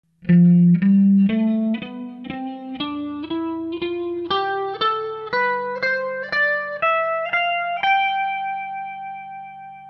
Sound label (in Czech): lydicka, kytara